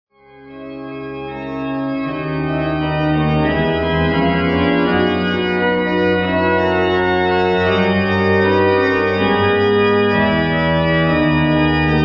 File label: Kyrie allegro moderato, orgel; Diessen; Fetis